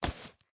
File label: Wig Fall; Game rip; MiSide